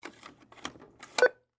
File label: ATM Insert Card, Industry, Atm, Insert, Card, Remove, Beep; Machinery